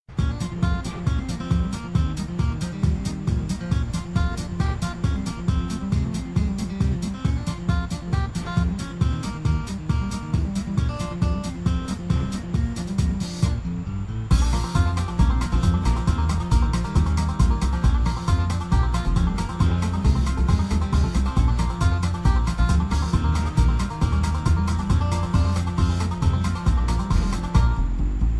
Pumping Station Interior Music

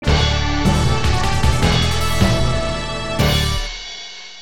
Stage Start

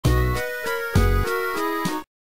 Transition; ratatouille gba 2007

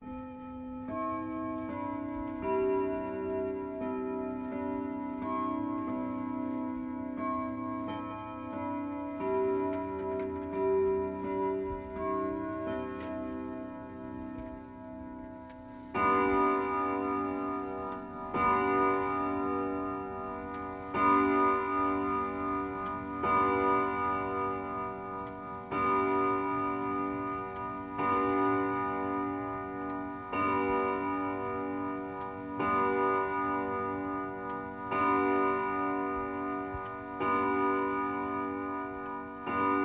clock chimes

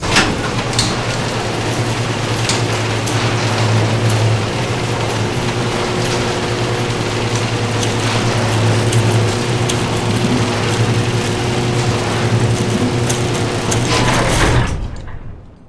Rollup Garage Open; silent hill homecoming 2008 gamerip pc ps3 x360

